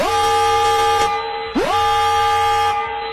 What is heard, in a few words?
russian alarm